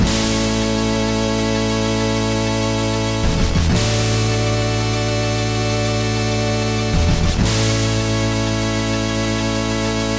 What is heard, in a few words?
music end loop